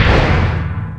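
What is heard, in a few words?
landing; Nintendo; chakuchi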